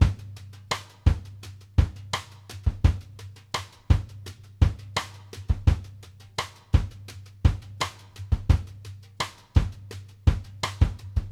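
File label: brush groove, ballad, drums; Sampleheads; New York City Drumworks VOL-1; SP BALLAD 84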